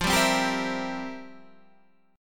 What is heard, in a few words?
F Major 7th Suspended 2nd Suspended 4th, Guitar, Standard; FM7sus2sus4 strummed; JGuitar